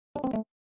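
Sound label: normal low battery, phone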